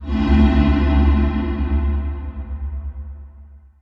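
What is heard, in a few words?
accordion sting; Monument Valley; android ios mobile gamerip 2014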